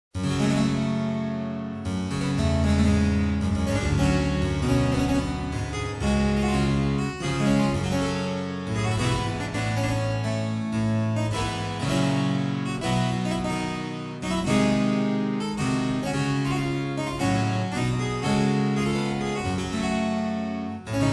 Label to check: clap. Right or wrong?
Wrong